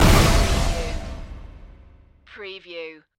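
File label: Production Element Hit Distort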